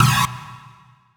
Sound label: Stab, Club Techno; 90 sSampleCDs